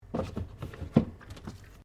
Carton Cardboard Cap Plastic Place Fridge, milk or juice container; Food; Household; SoundEffects Foundation2